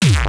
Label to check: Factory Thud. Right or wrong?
Wrong